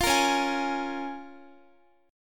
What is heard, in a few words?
C sharp 6th C sharp down Guitar; C#6; strummed; tuning=4E,4A,5D,5G,5C,6F